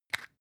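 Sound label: floss close compartment, Bathroom; haus-garten; badezimmer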